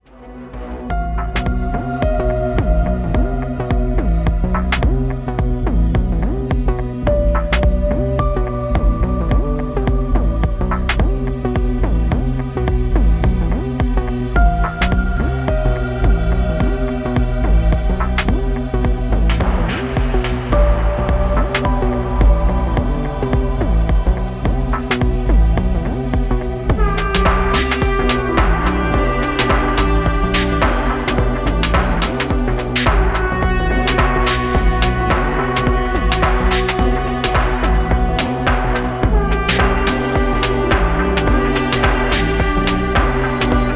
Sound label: Electronic